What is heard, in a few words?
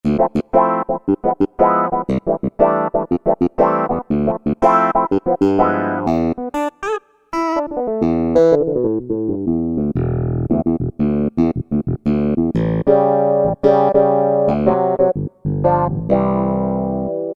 Mutron Clav, Korg N364; Factory Prog demos